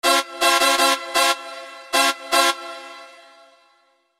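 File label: truck horn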